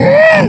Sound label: pain; basebuilder; hellgame; cstrike